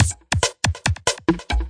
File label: 140 kickless echo techno